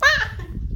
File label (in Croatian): crvić umire; umiranja